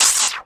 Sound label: SFX, Drum & Bass, 160 BPM; Ueberschall; Drum & Bass Resonance